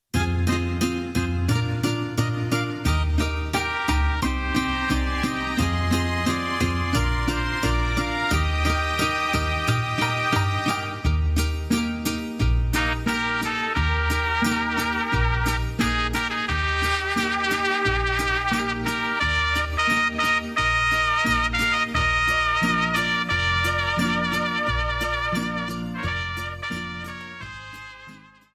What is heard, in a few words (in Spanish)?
Mariachi